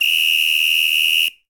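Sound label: Samba Whistle; Latin Percussion; Drums; Drum Hits; Ableton; Factory Packs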